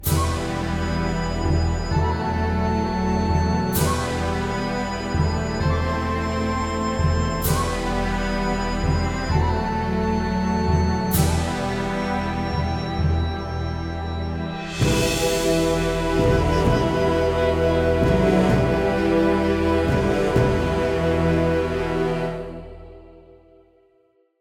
Elf Defeat; Disciples III Reincarnation

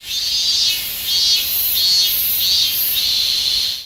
Cicada song; Zenonzard

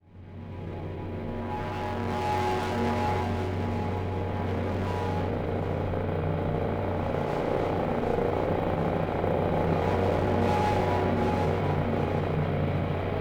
Night Amb Stinger, gamerip; dying light

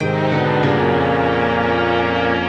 Lose

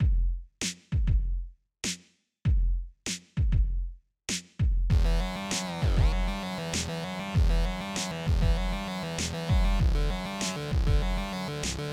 80s Vibe

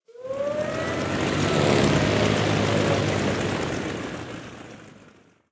Ice Snow Golem Plane

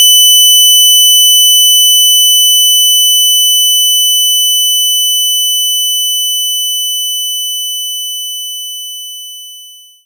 Saw Decline 3000Hz; knights try

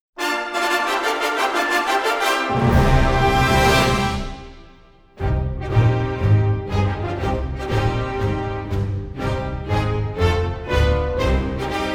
Menu Music

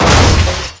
Vehicles; SFX Vehicles Scorpion

